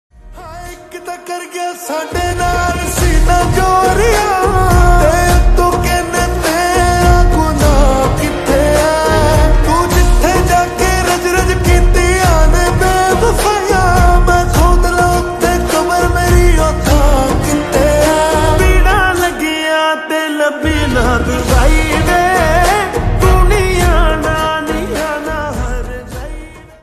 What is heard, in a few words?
Slowed Reverb Ringtone